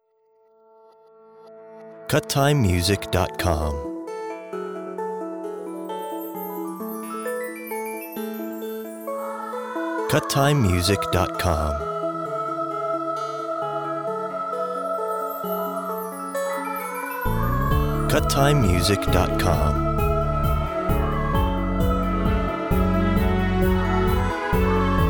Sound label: orchestral